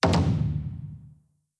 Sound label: Taiko; blue archive